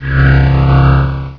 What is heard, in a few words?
pulse; Hexen2